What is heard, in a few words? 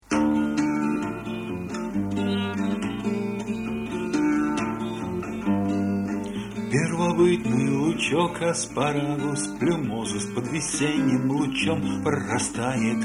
Duet